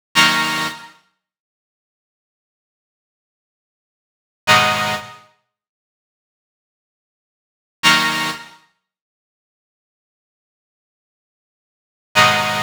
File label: 125 G#m Synths, Complex Electro Synths; Prime Loops; Transport